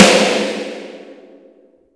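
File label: SN, snare; Best Service; Real Mega Drums VOL-1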